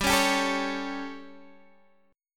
G Suspended 2nd Flat 5th G down Guitar Half Step Down, chord